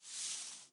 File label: penguin walk slide; CodenamePenguin